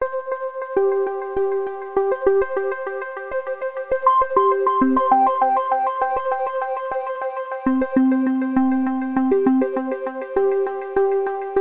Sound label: Intro Ambient